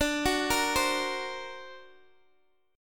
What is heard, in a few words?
D Augmented 7th down Guitar DADGAD